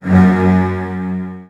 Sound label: Virtuoso Strings; ILIO; Kirk Hunter; CL 16TH